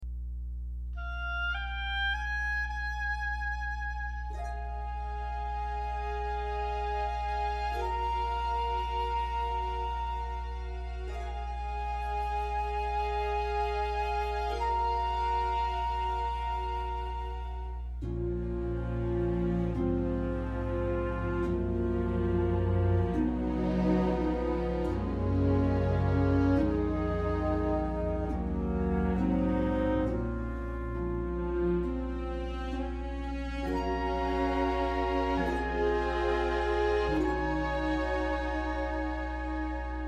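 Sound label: accompaniment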